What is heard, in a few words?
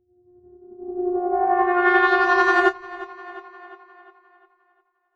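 alarm siren, filter processed, 170bpm; musicradar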